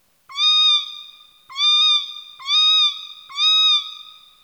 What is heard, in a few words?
cat